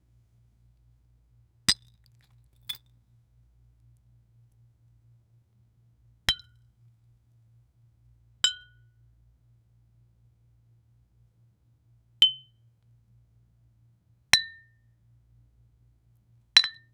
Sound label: bubbling of liquids in gl